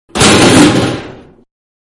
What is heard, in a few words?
Garbage Can Throw On Concrete; Household; Can Garbage-Throwing Onto Concrete; Manhattan Production Music